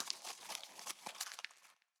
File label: Crumpled Paper